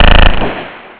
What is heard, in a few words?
TANK; MOTHER